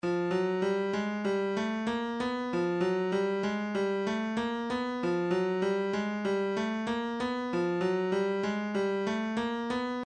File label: stylus percussion midi files tambourines 096 jingle